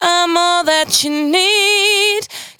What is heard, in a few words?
92bpm Bb, Velvet Vocal; Zero-G